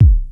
round kick single hit d sharp key; Duration 0:01; Channels Mono; kick drum samples

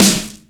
steel snare drum sample g sharp key

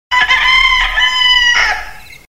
cock crowing; soundscapes

